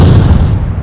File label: Xplos hor; RivalBall for Macintosh